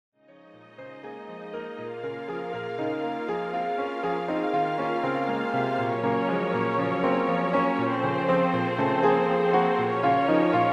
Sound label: quintet, harp